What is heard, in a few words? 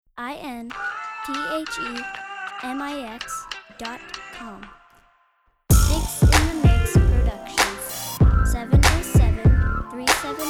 duke funk